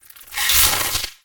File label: attack, Flying Insects; ice age scrats nutty adventure